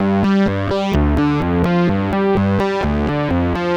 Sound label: Synth Loops, G 127; Zero-G; Soundclash Nu Dance Electro; Acidized; 127bpm